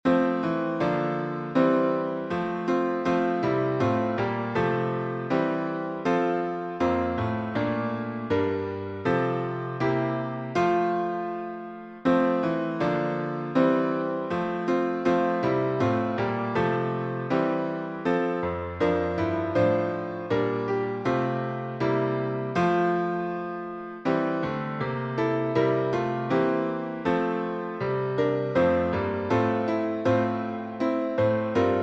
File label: piano synth; Little Free Music Library